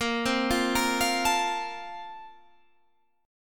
Bb Major 7th Suspended 2nd, Guitar, Half Step Down